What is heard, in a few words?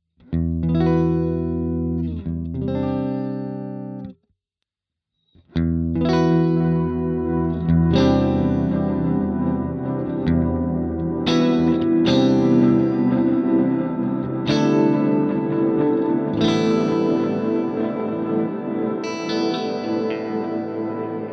Chords